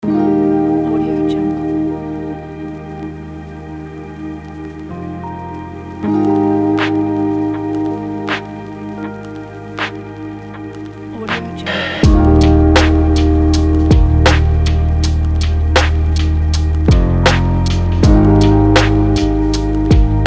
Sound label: hip hop chill lo fi music